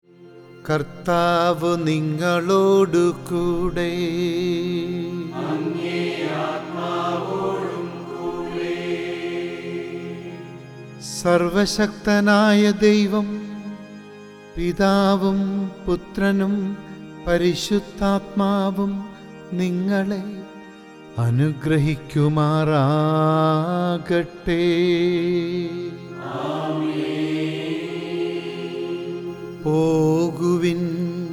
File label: Chant Last Blessing; ordo cantus